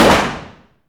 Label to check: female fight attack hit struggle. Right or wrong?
Wrong